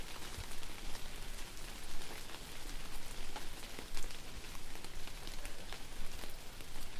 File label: rain